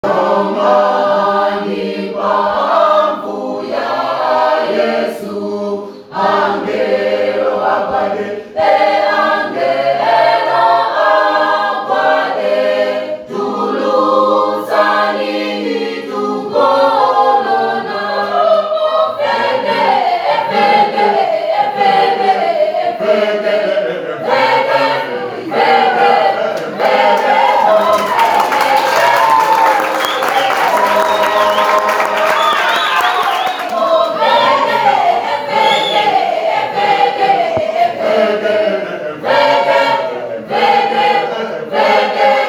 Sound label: church choir service on phone